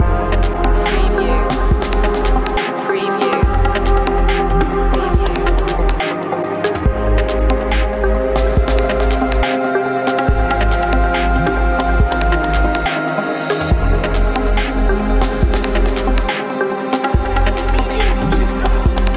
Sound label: deep dub garage loop